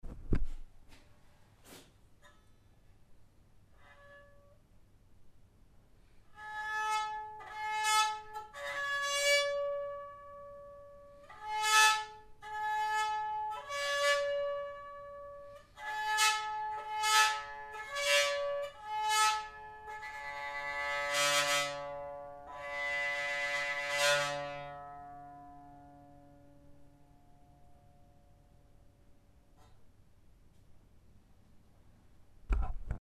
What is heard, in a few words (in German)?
Trumscheit